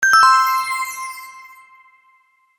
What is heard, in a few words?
Correct; Bataille stellaire